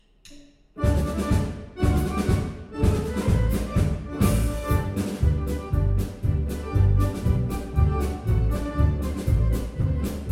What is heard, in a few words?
Polka Romagnola